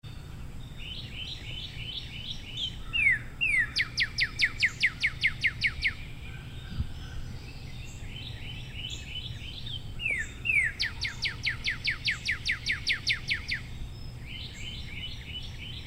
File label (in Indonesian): suara cardinal